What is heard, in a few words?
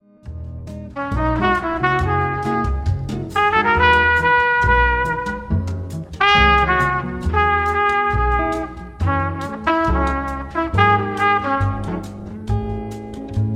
nocturnal jazz melodies